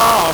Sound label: LSDj Acid, Nintendo Gameboy; 300 Drum Machines